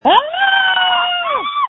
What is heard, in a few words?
screams